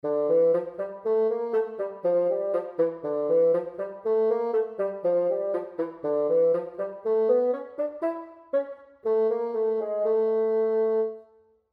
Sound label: Bass Clef Example